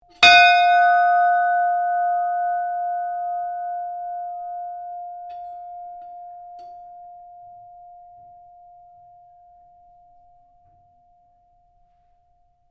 SHIPS BELL RING; Hmas Castlemaine 1942; Various ships bells; Boat Sound Effects; Boats (Sound Effects:Vehicles)

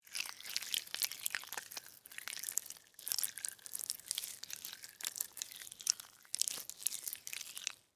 Slug Crawl Move, Slimy, Wet; Animal; Worms; BlastWave

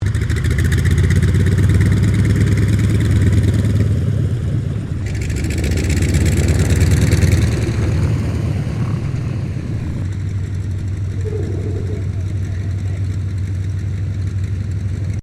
AIRCRAFT CA-28 CERES TAXI; Airplanes (Sound Effects:Vehicles)

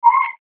Beep Tone Signal Multimedia

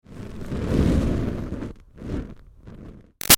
Fire Torch Whoosh Slow Light Tails; Flames; Objects; Big Room Sound

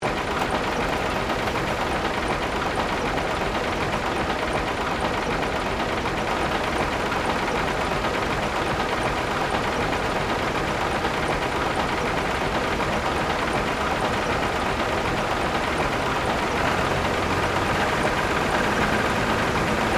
Steampunk Engine, Scifi, Steampunk, Engine; Science, Fiction

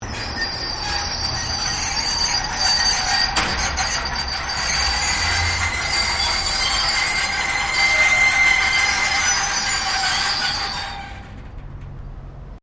Door Roller Aluminium Lower Metal Scrape; Various doors

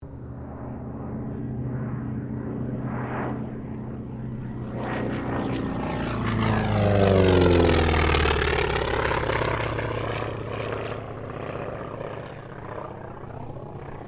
AIRCRAFT T-28D TROJAN PASS

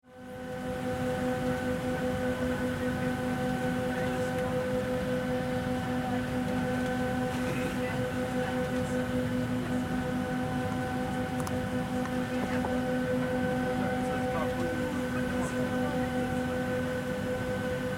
Airplane Interior Boarding; Transportation; Airplanes; Vehicles

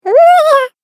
Cartoon Game Voice "Oo weyee aa"; Cartoon Voice Sound Effects

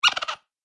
Gremlin Laughter, monster sound effect; Gremlin Laughter 01; Horror; Andrew Fleming

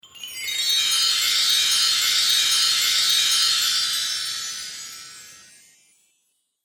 Christmas Spell, Magic Sound Effects

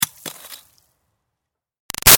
Ice Rock Impact Small Tinkle; Rocks; Materials; BigRoomSound

